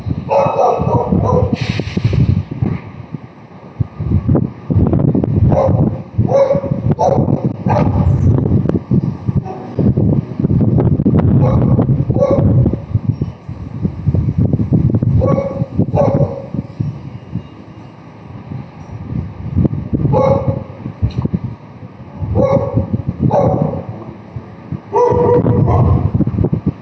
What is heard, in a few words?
bark samples